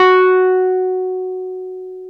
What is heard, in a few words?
Wurly, Keyboards of The 60's and 70's